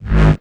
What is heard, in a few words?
Offbeat Bass G#; Vengeance Samples